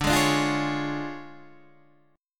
D Minor Major 7th Flat 5th, D, Guitar Standard; 10,11,12,10,9,9; DmM7b5 strummed; JGuitar

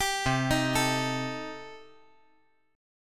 C Minor 6th C

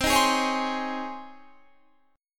C sharp Major 7th Suspended 2nd, C

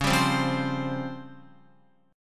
Db Major 7th Flat 5th, Guitar; DbM7b5 strummed; JGuitar

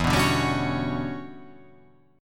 E Minor 13th chord

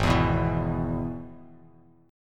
Ab Minor Sharp 5th Ab, Bass Standard; Abm#5 strummed; JGuitar

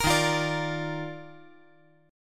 D sharp Major 7th Suspended 2nd; strummed; JGuitar

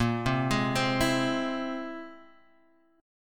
C Augmented 7th A sharp

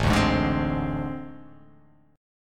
A Minor Major 7th Double Flat 5th, down

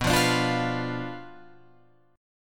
B Diminished B down Guitar Open F; Bdim strummed

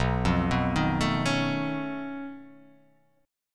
B 9th Flat 5th B down; B9b5 arpeggiated; JGuitar

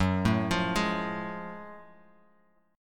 Gb Minor Major 9th Gb down Guitar Half Step Down

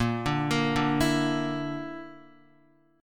Bb Major Flat 5th, Guitar; x,1,2,3,2,0; 4F,4A,5C,5G,5C,6E; arpeggiated

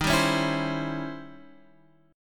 E flat 13th Flat 9th E flat; strummed

